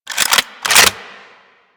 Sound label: Pistol Reload; Kohm